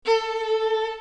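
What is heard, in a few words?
violin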